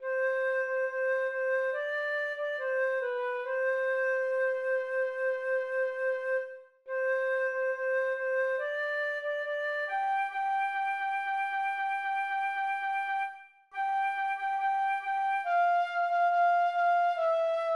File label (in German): Bele Mama C-Dur nur Melodie